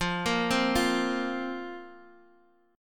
F Suspended 4th, Guitar, Open D; x,8,8,6,8,x

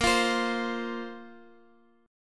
A sharp Suspended 2nd down Guitar Open D

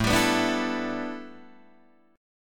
A 7th Suspended 2nd Suspended 4th Guitar Open D

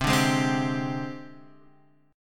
G sharp Major 7th Flat 5th, chord

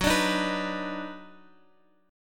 Eb Minor Major 7th Sharp 5th Gb, chord

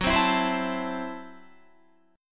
Gb Minor 7th Flat 5th, Guitar; Gbm7b5; strummed

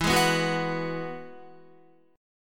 E Major Add 9th, Guitar, down; strummed; JGuitar

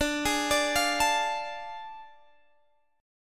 A Major 7th Suspended 4th Sharp 5th D

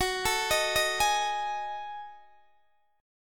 Gb Minor 6th Add 9th Gb down Guitar Open D

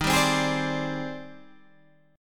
G sharp Major Sharp 11th, guitar; 11,10,10,13,13,10

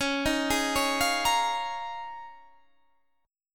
Eb 9th Suspended 4th

